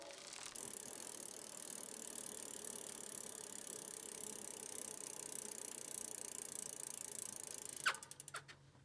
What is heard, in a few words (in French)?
BRUIT Roue velo